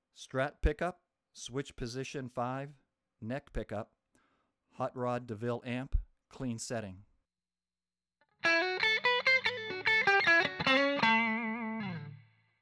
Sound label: strat neck hotrod clean, guitars